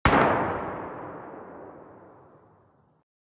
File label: dist08, distant cracks